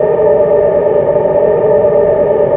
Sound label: drone; hl2; roof top